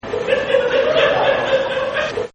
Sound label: Weird Laugh; Laughter; Partners In Rhyme